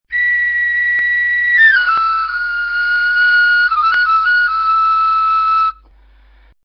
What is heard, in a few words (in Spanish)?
castrapu; tradiberia